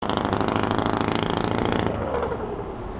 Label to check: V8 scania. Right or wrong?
Right